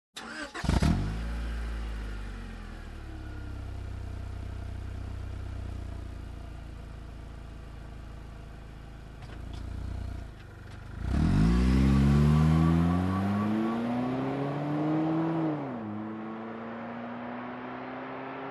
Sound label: Car Engine ignition and drive away fast